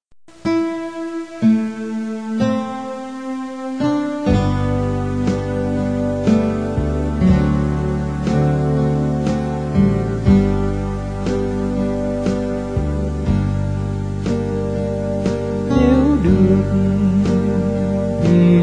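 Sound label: soprano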